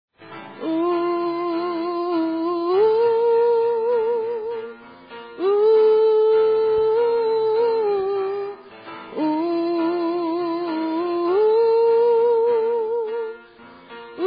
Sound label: tenor